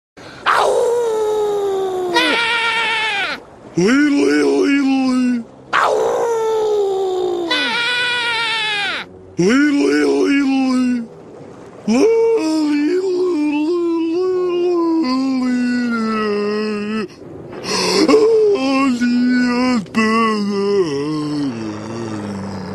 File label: Leedle Leedle Leedle Lee; Patrick Star